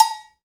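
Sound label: percussion cow, cowbells; Roland L-CD701; PRC Latin 1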